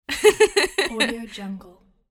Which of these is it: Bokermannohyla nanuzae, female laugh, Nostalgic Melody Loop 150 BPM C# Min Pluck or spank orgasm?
female laugh